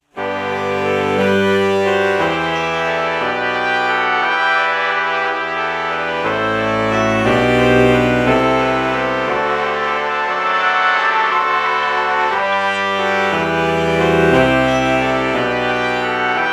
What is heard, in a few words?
alto saxophone trumpet morph